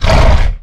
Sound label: Rock Throw; Evolve; Goliath